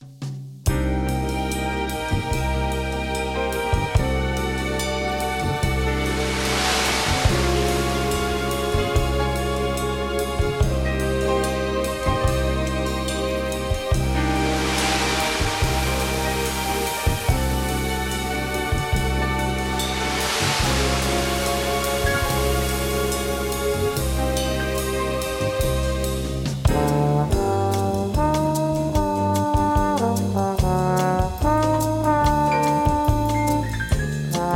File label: bossa nova